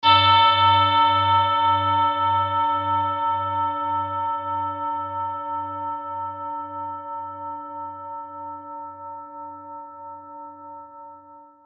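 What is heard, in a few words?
tibetan bowl marsset, D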